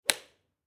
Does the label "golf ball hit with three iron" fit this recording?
Yes